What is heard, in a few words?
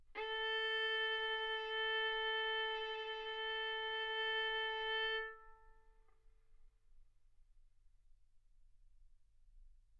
Violin arco A4 B4; mf; sul A; stereo